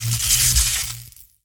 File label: Thunder; Naipin